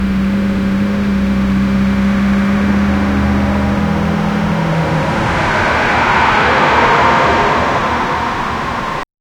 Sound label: a car honking and screech; road